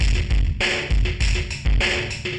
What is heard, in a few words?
ind dist